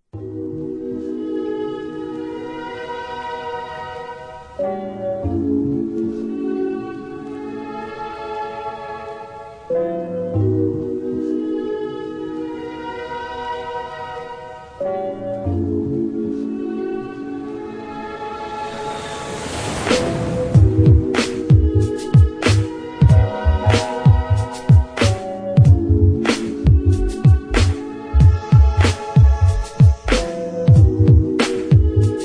instrumental

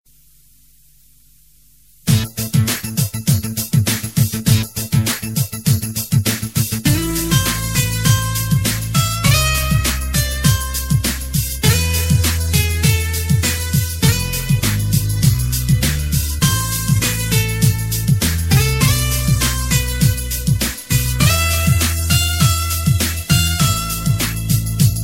Ring Tasty Beat

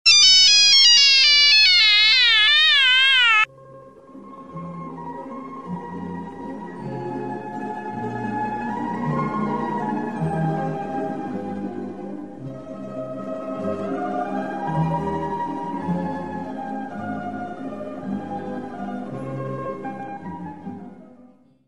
nokia russian

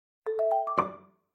Sweet message sound

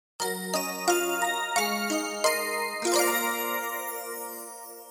sms; Melty Moment

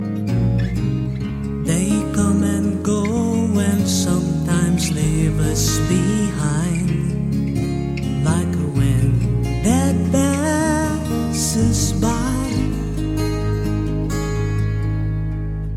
flute music; christian

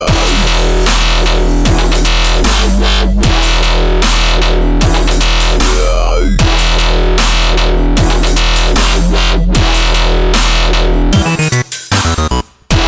Add bass

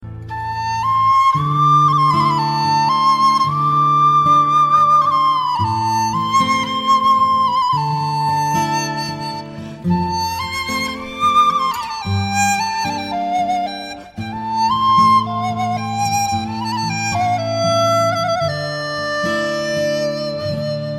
chines flute

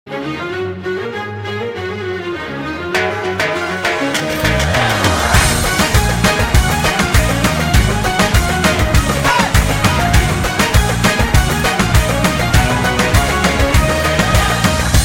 Latin Music Tone

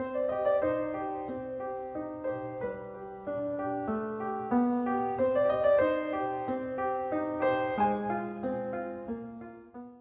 Music Box

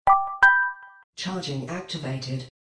Charging Activated

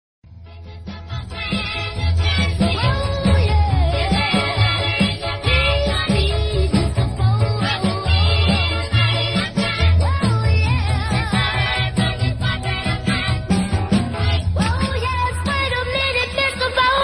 dubstep remix

